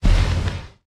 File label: asteroid collision oxide small; Factorio